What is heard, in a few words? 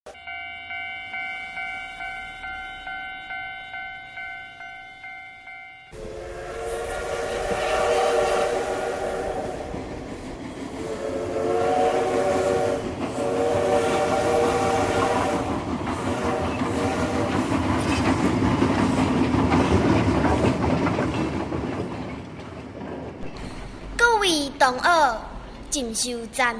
background music train